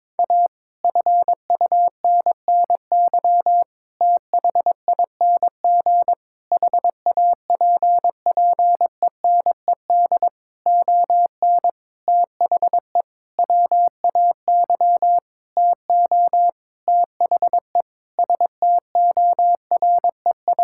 Sentences with 9-Word Phrases - 22wpm, morse code practice